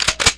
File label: shotgun reload; hedgewars